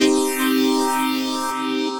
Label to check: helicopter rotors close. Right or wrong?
Wrong